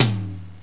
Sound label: Low Tom; Drums; XG